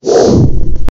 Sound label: boss spawn sound, monster